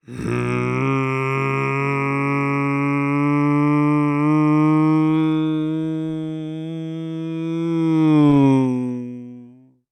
Human Male Voice Motorcycle Sounds

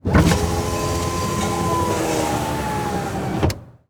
Truck Ford Ranger Roll Down Window Exterior; transportation

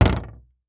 Industry Hatchet Dropping On Hollow Wood

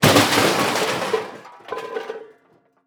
Impact Metal Hit Cement Medium Hit; Impacts

